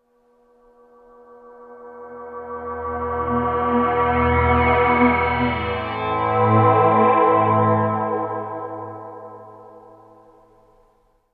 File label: Guitar Chord Musical Reveal Resolves, Guitar Chord Musical Eerie Reveal Resolves; Eerie Haunted Horror Sound Design; Guitars (Sound Effects:Musical)